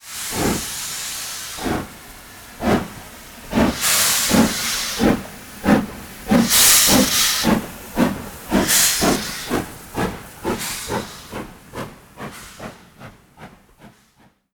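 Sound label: black five, train; konakaboom; simutrans; Pak128.Britain-Ex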